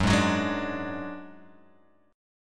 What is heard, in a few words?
Gb Suspended 2nd Sharp 5th, Guitar, Open G; 4,1,4,1,3,x; down; chord shape